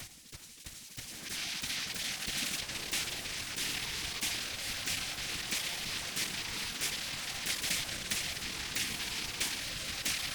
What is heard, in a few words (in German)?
B-Saite Song; B-Saite Song diff